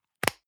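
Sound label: deodorant can lid close hard, badezimmer; haus-garten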